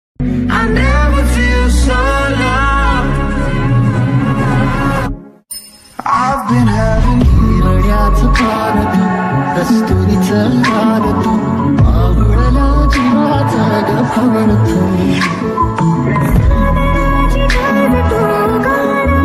marathi ringtone

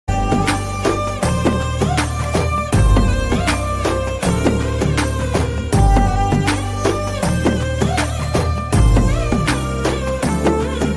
telugu songs; kanguva; surya

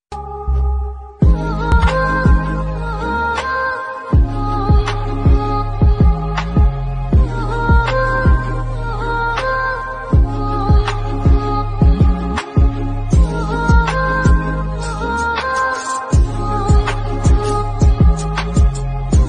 love bgm ringtone tamil flute

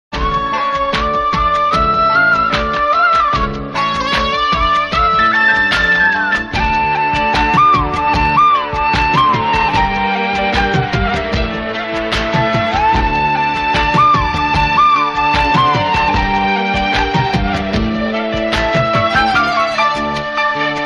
tamil song bgm love

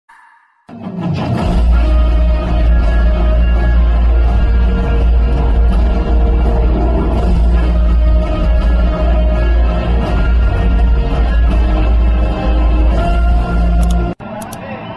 interval bgm ringtone; guntur kaaram

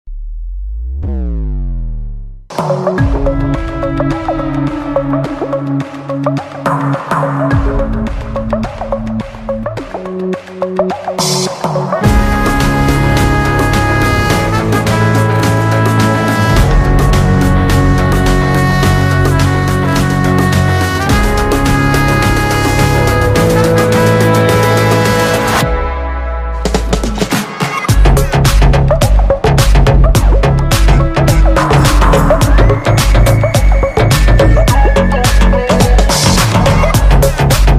love ringtones mass bgm

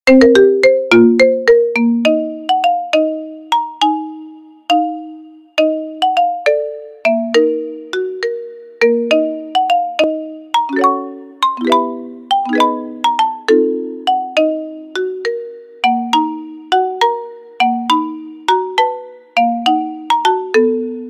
theme marimba ringtone

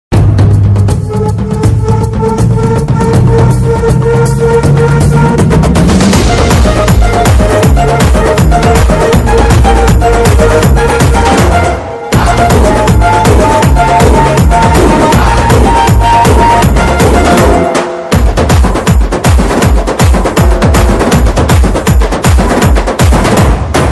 bgm ringtone instrumental bgm ringtone; rrr

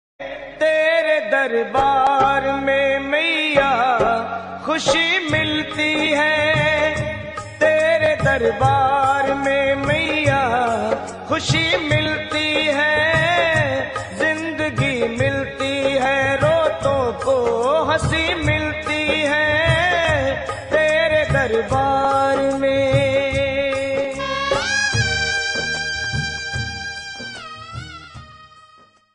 navaratri bhakti ringtone; mata rani ringtone; durga mata bhakti ringtone

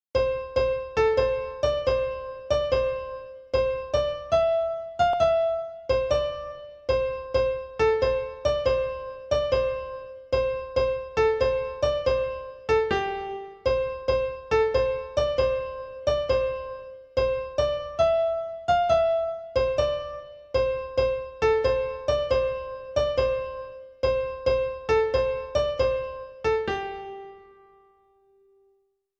telugu bgm

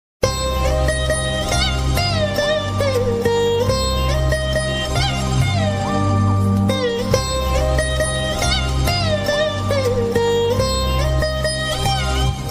bgm sad; khadgam

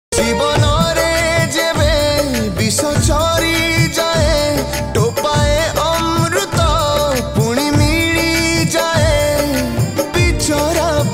jagannath bhajan; jay jagannath bhajan

